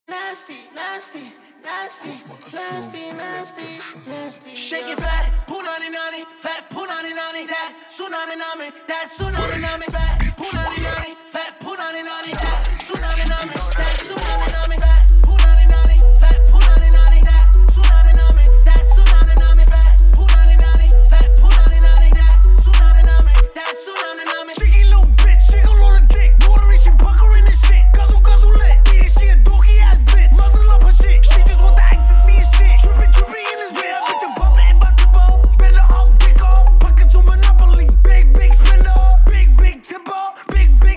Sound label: Bass